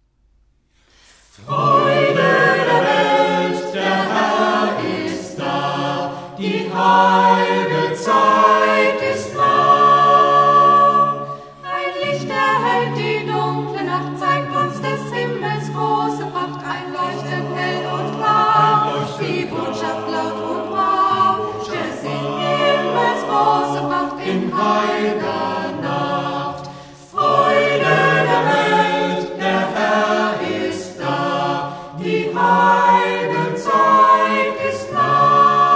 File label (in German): Vokalensemble; PatchWork; Freude der Welt